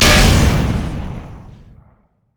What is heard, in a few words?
dash; Vi